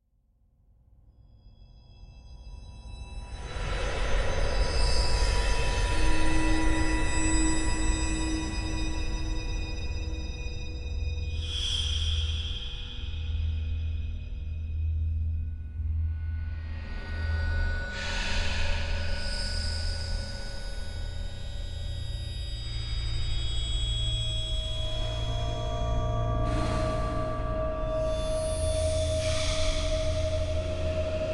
Pad Scary - Eerie Sharp Tones And Breathing; Hollywood Edge; sound designer's toolkit v2

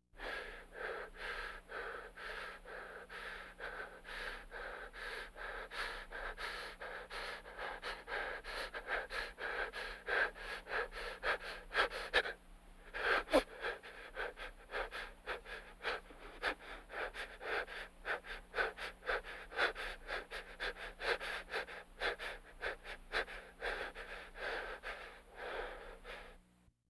Vocal effects breathing Moaning Baby

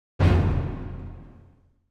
Symphonic Hit Drum And Strings Finale - Single; Hollywood Edge; sound designer's toolkit v2

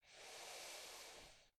deep breaths male in; Apotheorasis

